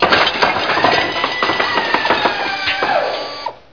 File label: building